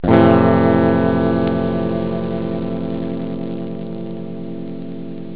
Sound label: fmaj7, guitar